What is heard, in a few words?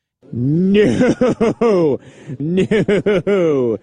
nooo